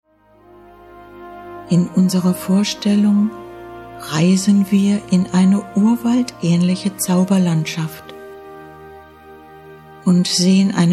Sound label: Meditation